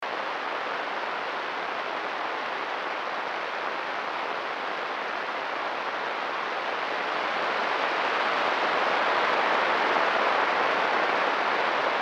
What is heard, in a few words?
Type III 22.21MHz; Ashcraft